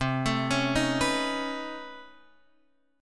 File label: B 9th C down Guitar Standard; B9/C arpeggiated; JGuitar